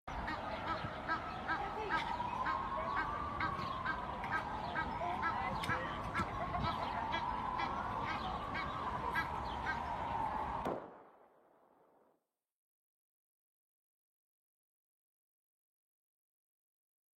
Canto do ganso do egito

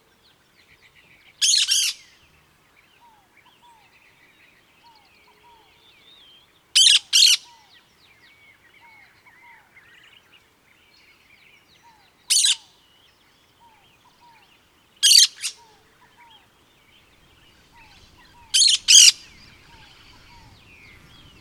canto do periquito da caatinga

